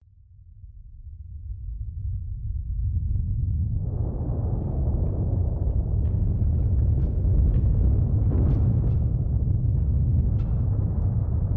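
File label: earthquake rumble shaking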